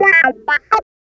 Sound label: Clavinet; Ambient Grooves